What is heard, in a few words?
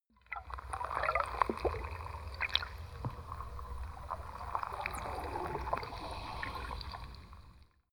sea